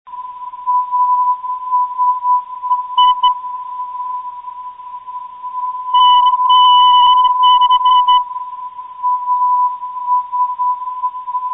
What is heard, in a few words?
as 324khz, ndb